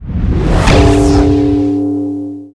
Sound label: skill shot fire